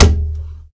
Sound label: high floor tom sound d sharp key; tom drum